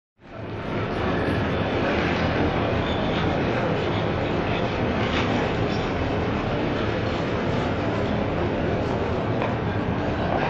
Train Heavy Activity London Station Euston Railway Station; Hollywood Edge 3-D SFx